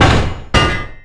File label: Enchant Hammer Miss